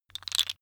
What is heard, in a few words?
tiles wood; objekte; holz